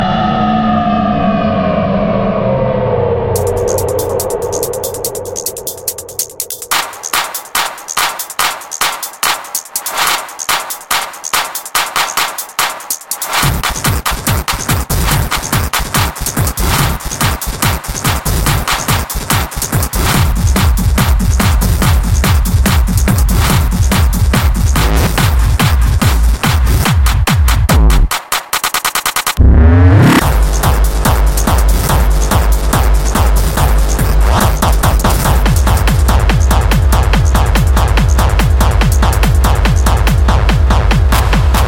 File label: beginner hardstyle; City Trainers